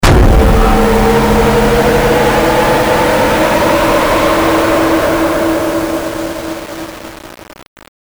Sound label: jumpscare; slender; zerosiki; schizophrenia